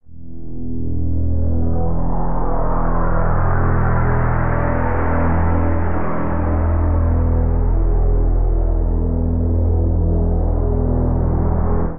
Bass E 80 Bpm; MIDI Clips; Instruments; Ahab; Ableton; Drone Lab

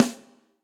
Snare & Press; Bright Room; Drum Hits; Ableton; Factory Packs; Session Drums Studio; MPE Hits

